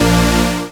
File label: Synths A#; Vengeance Samples